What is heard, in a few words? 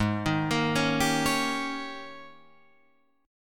Ab Major 7th Suspended 2nd Suspended 4th Ab, down, Guitar, Open F; AbM7sus2sus4 arpeggiated